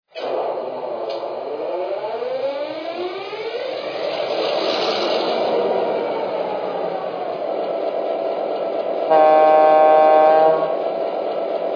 f3, trains; wp

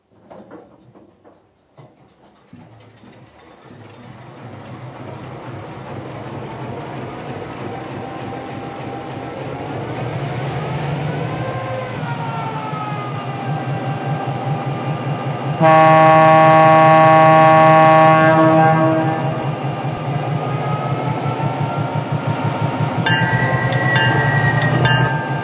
EMD SW8

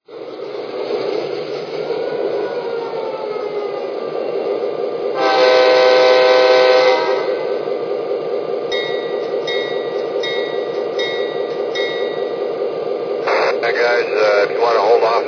gp9; ns

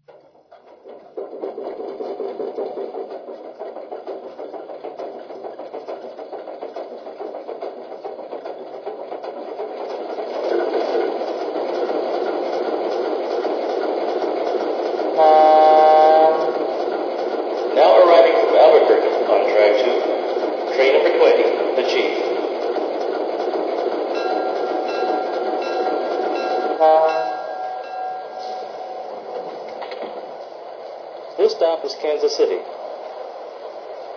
alco pa, trains

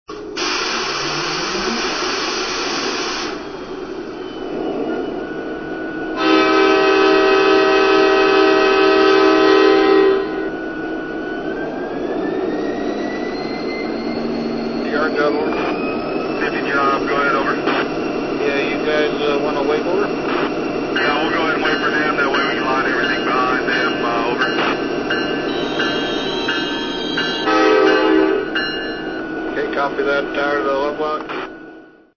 SD70Mac, trains

